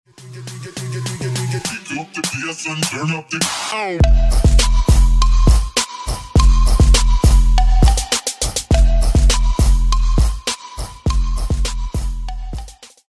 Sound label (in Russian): muzyka dlya donata